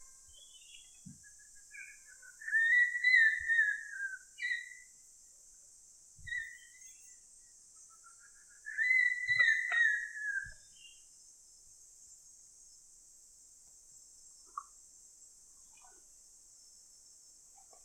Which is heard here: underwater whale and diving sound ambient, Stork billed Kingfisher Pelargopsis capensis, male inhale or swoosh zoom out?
Stork billed Kingfisher Pelargopsis capensis